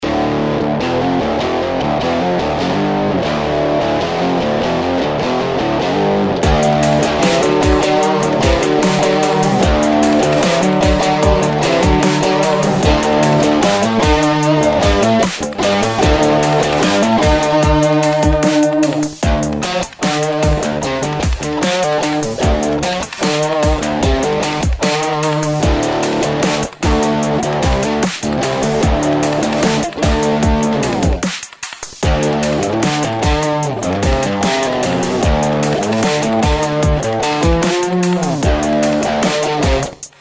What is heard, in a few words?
Drop D Trap Guitars